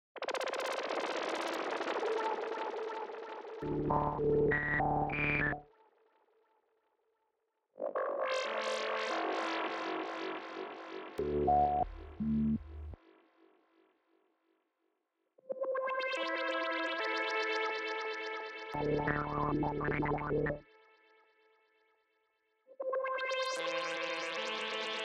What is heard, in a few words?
BASS MELODY